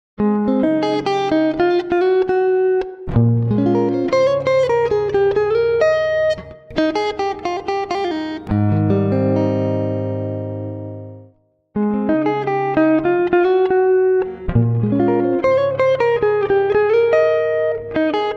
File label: Godin C-switch single lines